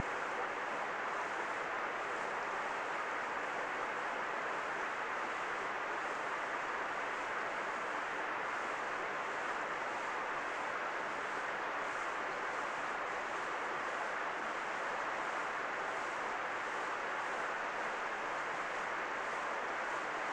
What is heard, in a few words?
muffled rain; DELTARUNE